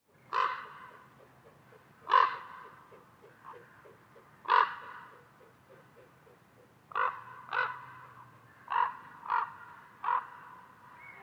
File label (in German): Kolkrabe, Schorfheide